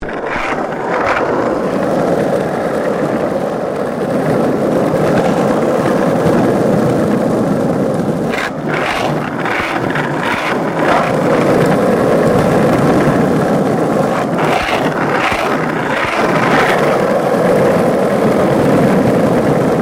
SKATEBOARD UPSIDE ASPHALT MEDIUM; Skateboard sounds; Skateboarding (Sound Effects:Sports); SoundEffects-Foundation4

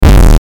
Hit Electric, Hit Electric Distortion Low; Electrical Button; Blastwave

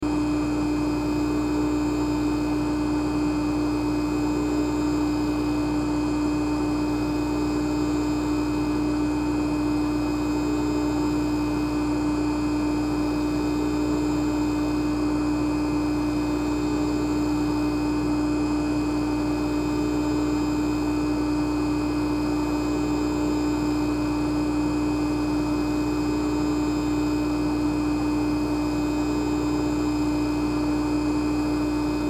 Jacuzzi Run, Jacuzzi Run Water Motor Loop; Household; Bathroom

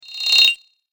Very Fast Ripple Whoosh; swish, sweep and swoop

